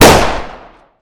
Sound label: fire, weapons; g3sg1